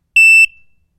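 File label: Alarm Electronic Beep; hi-tech-various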